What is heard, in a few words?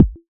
kick strange ringing F